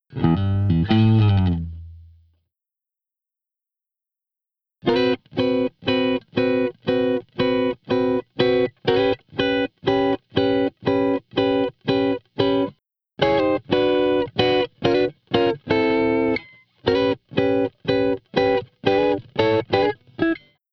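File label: Blues Guitar; Pro Tools Essential Loops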